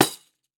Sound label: PANDEIRO